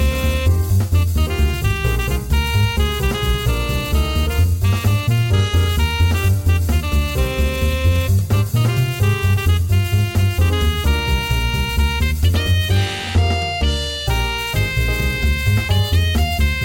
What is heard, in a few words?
Jazz; Sf2 Guile